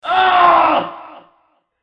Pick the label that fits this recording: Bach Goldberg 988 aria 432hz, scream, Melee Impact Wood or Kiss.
scream